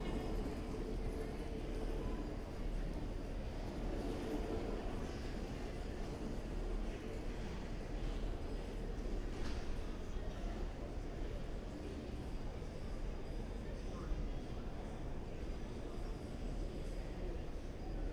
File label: Urban Large Building Bell Ringing INT Stereo Binaural